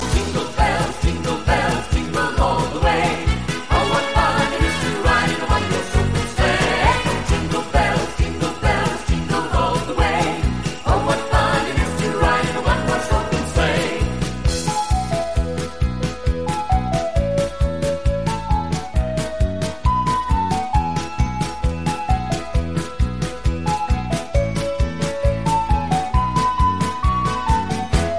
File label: Jingle Bell